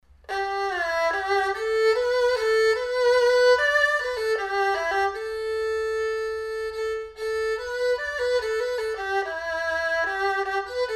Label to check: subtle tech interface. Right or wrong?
Wrong